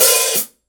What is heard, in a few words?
hi-hat open